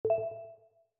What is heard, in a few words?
chat received